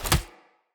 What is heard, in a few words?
Grunt Foley Kick Impact; Weapons; Enemy